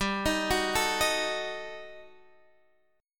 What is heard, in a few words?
Eb Diminished, guitar chord; Ebdim/G arpeggiated; JGuitar